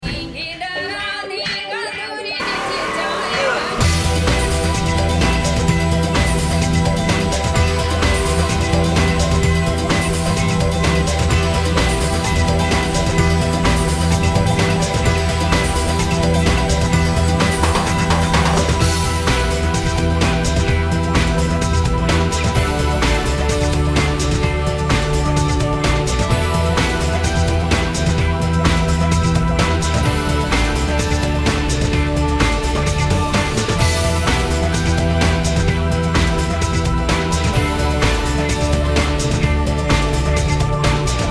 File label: funk